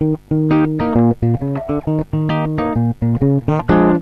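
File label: Les Paul tube bridge, Bridge pickup, Ламповый пред в линию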